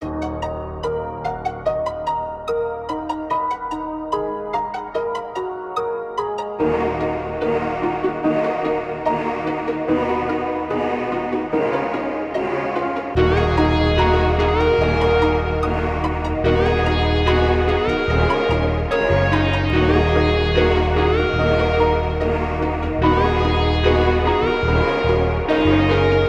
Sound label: CINEMATIC MUSIC